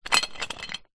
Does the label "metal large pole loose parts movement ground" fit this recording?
Yes